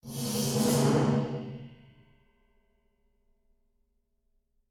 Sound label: Scrape